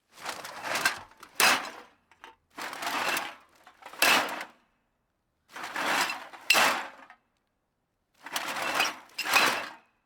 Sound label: cans in bag